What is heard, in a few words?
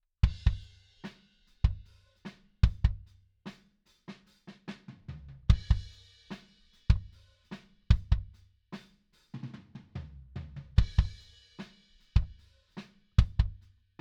Kick